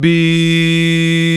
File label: VOX Male Scats; 90s Sample CDs; Club-50 - Foundations Roland